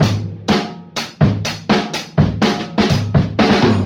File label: drum roll pleeze, funky drum loops